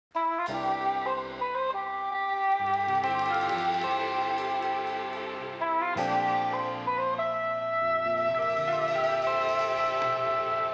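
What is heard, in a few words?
175bpm C major; bigcity pizza head; chicory a colorful tale; ps4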